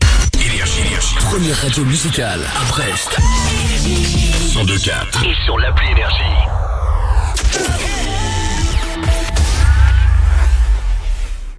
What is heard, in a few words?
JINGLE